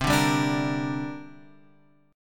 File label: C 7th Suspended 2nd C; C7sus2 Chord {8 5 5 7 X 6} strummed